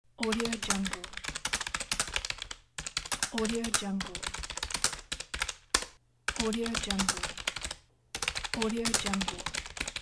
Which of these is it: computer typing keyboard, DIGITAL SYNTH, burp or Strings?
computer typing keyboard